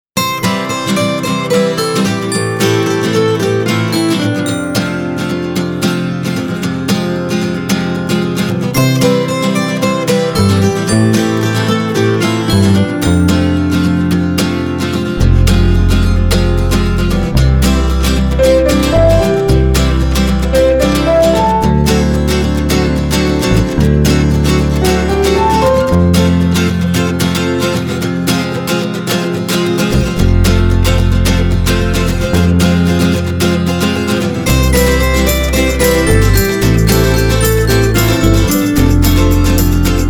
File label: FOLK RETRO